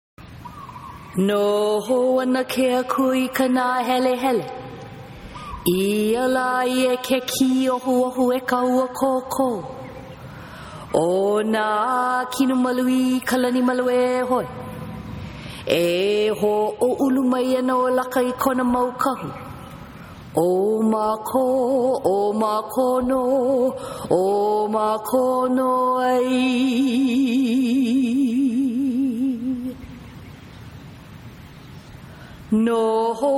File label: lemurian chant for earth shielding